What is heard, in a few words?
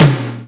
power tom; Greytsounds; Scott Peers Sound Engineering